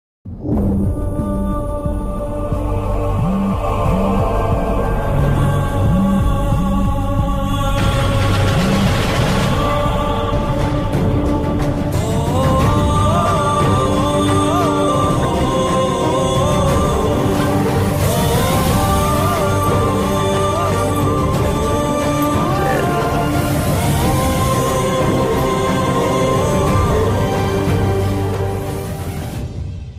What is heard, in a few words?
emotional Sad Bgm